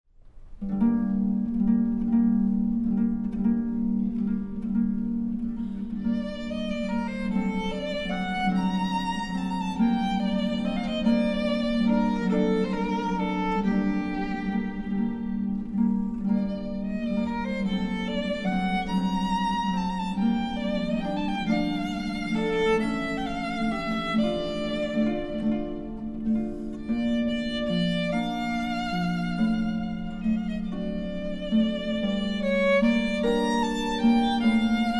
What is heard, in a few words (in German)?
Violine Harfe